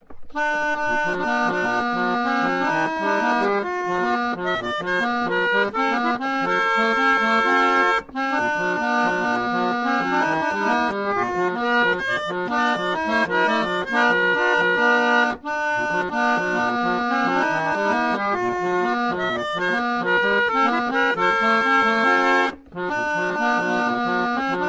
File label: kentucky, Roller Organ